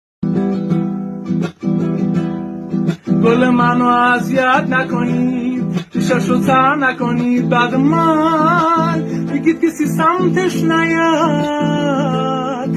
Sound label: Ringtone